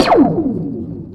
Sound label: laser gun; Brickshelf; GlenFunberg